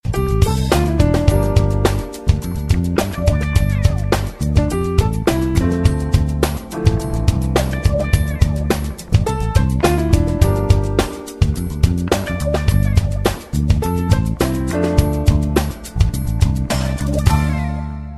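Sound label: melody for ringtone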